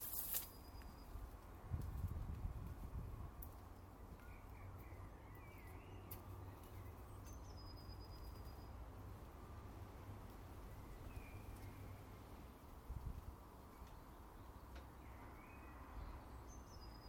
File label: sounds from the garden raking brushing filling bird bath soundscape; 6 minutes